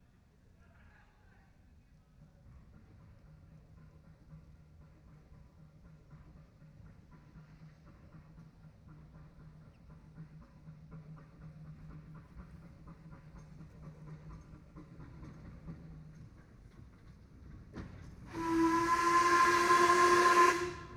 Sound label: Vehicles Steam Train Passing By Stereo Binaural